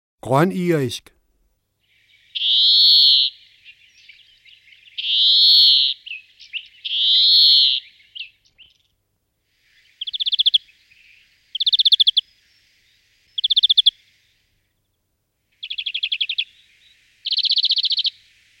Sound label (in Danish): Gronirisk